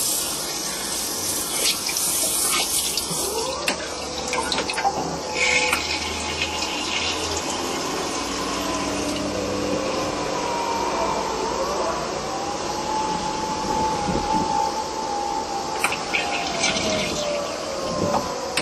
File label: toilet, police, typhoon